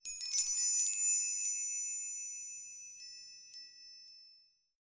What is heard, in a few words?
Chimes; Plant Tycoon